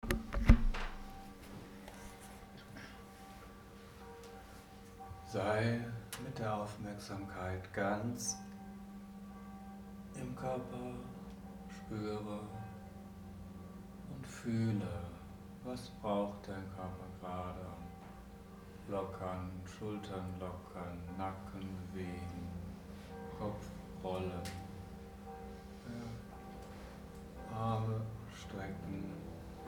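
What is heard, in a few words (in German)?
Seelenlichtenergie tönen mit Trommel; Seelen-Energie kontaktieren & tönen mit Trommel; Meditationen als Einstimmung und Wahrnehmungsübung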